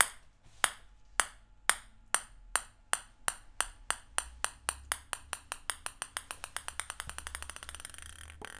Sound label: Ping pong